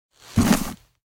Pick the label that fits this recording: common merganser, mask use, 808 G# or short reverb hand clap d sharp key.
mask use